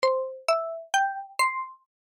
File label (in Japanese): pinponpanpon